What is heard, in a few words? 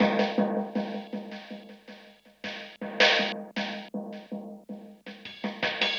DRUM LOOP ambient 160